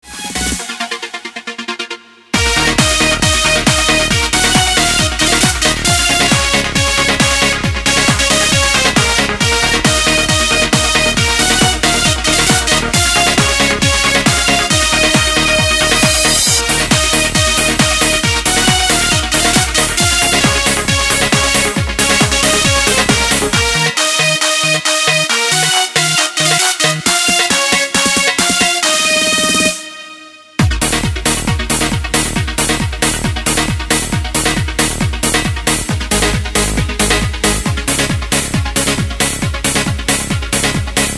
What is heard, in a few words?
Trance